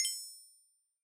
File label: bell